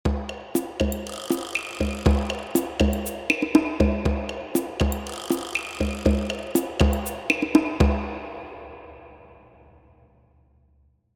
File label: drumloop racquetball court